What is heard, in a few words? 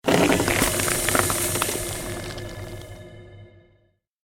CREATED MAGIC SPELL FREEZING TOUCH